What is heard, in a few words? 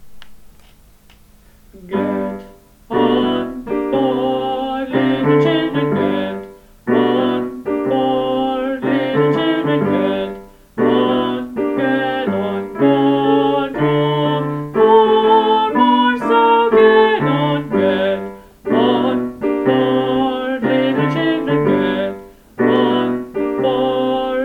get bass